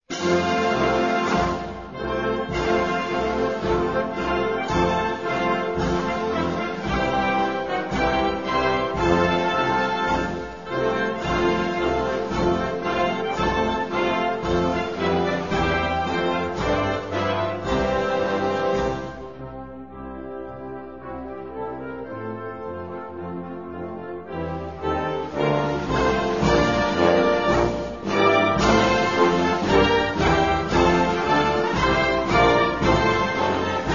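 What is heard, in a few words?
anthem, Albania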